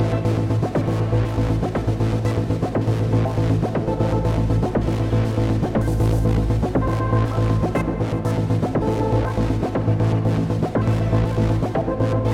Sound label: Combat Loop; Void Bastards